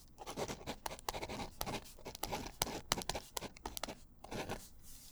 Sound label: Pen Writing; Books, Paper, Writing